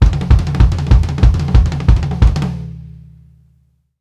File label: LOT A DR, Drumworks FILLS 96; Sampleheads; New York City Drumworks VOL-1